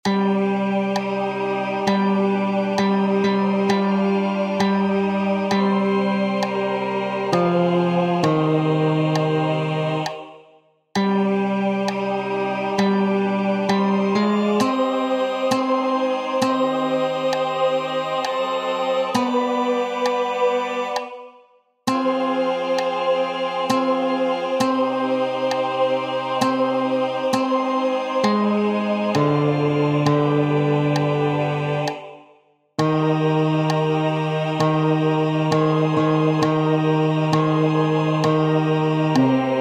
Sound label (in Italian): Voci Uomini; Oratio Ad D N Jesum Crucifixum; gesu crocifisso